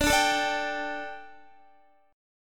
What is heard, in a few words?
D Suspended 4th D, down, Guitar; strummed; 4F,4A,5C,5G,5C,6E